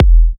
E kicks; markus hakala kicks

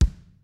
kicks snare, kid; Kid Nepro; Elite; KICKS SNARES

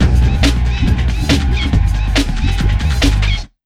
Big Beat Drumloops

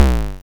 tom tom; Nasta Hit Stix2

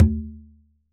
C FIST C, CONGAS TUNED; Q Up Arts; Denny Jaeger Private Collection

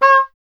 E. Horn; Miroslav Vitous; Solo Instruments VOL-2; Solo lnst. II

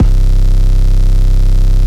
C Bass; Electronisounds; Vibration Expedition One Shots

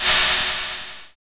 DRM ROLL, Brushes; Roland L-CD701

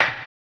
HAMBONE; Blues & Soul

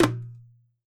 drm, WORLD DRUMS

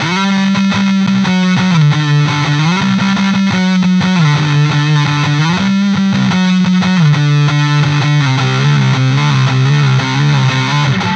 Rock Guitar

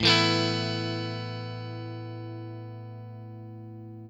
CHORD LN 6, guitar; Quantum Leap - Guitar & Bass

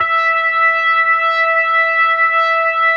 E5, B3

